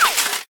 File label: slide